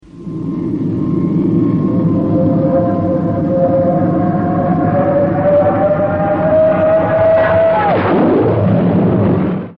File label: Zoom